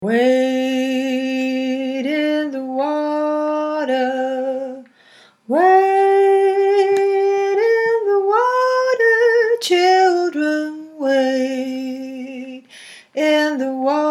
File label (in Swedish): Wade melodi